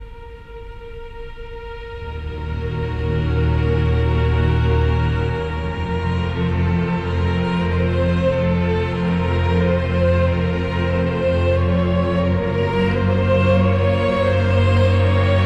English Symphony Orchestra Adagio For Strings, Opus 11